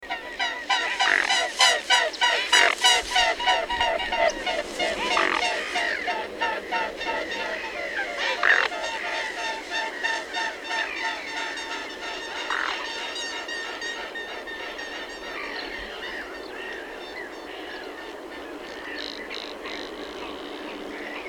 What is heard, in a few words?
mute swan